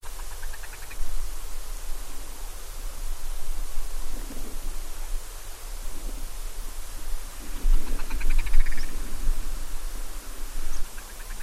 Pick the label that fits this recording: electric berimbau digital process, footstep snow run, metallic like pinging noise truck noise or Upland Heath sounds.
Upland Heath sounds